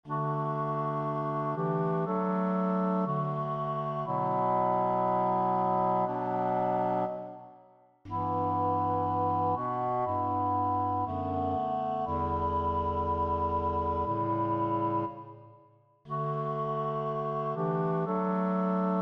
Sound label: tutti